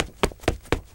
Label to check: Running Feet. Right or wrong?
Right